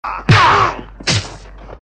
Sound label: FIGHT FIST; BRUITAGES